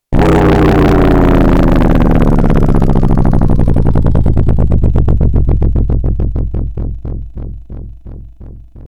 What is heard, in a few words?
Ship Landing